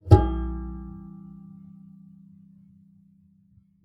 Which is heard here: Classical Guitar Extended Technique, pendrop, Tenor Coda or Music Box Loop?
Classical Guitar Extended Technique